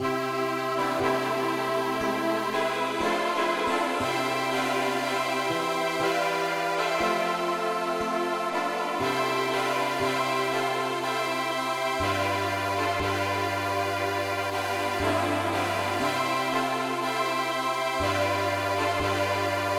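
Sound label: pop no vocal key C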